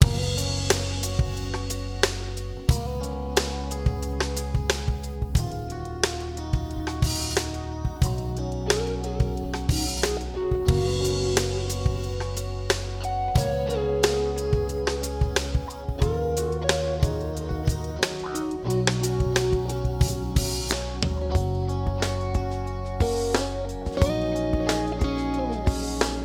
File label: Drum Service, Song, Before; VoxengoDrumService